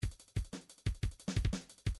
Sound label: drums afro cuba, midi drums